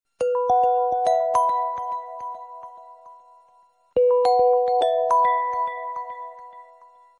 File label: cute windchime sms